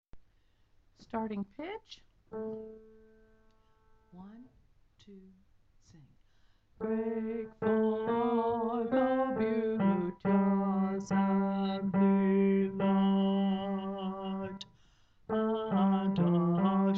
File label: Tenor